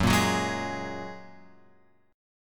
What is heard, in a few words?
F sharp Major Add 9th; F#add9 Chord {2 1 4 1 X 4} strummed